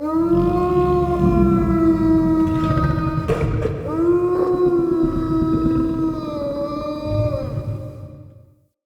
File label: Wolf Sad Howl; Animals; Perception